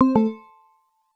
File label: win